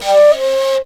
FLUTE LIN; Best Service; Ethnic Journey Sound Cube

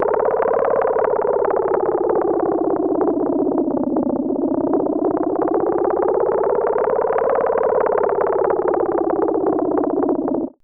RATTLE, RHYTHM; AMG - Vince Clarke Lucky Bastard

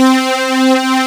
JUMP; East West; Global Sounds

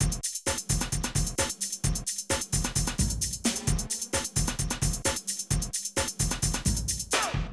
Drum loops, Rave & Hardcore

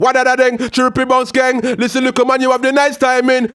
HAL RAGGAM, RAGGA-MUFF A maj

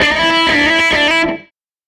SG Guitar Loop

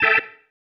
Stab, Organ, One Shots

